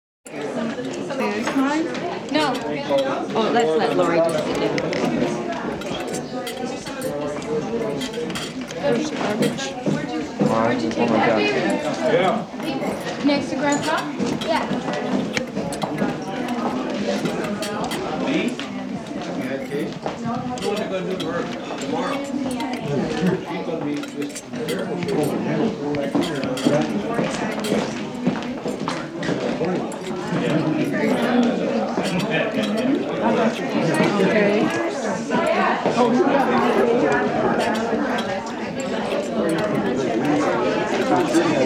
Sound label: Dinner Background